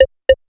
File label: CLICKER C3; Big Fish Audio; Synth City